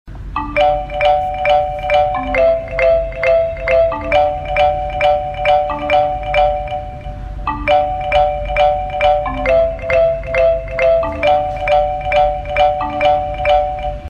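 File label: Bphone ringtone music box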